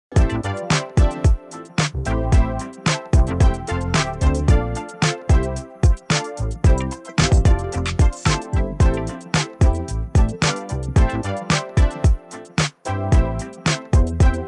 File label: pop rhythm